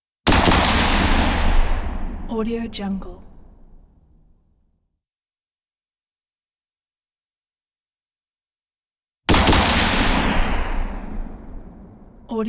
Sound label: m4 shots